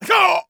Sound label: hurt medium